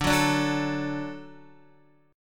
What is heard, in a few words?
D-Major, D, Guitar, 4F, 4A, 5C, 5G, 5C, 6E; D strummed; JGuitar